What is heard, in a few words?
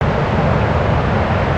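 city drone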